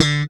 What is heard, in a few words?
Bass, pull D3; Sampleheads; Will Lee Bass Library